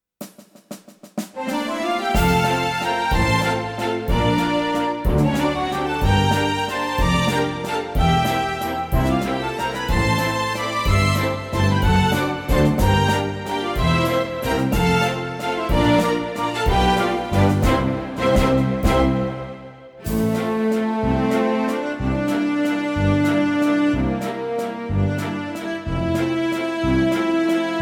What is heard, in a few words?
Skaters Waltz